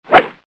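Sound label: sound of a hit without a ball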